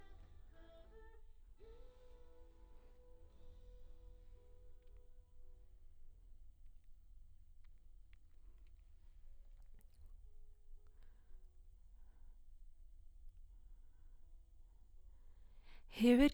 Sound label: Ld VOX Double